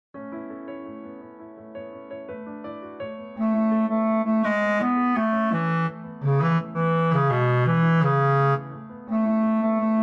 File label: song piano with guide; Little Mermaid; Sebastian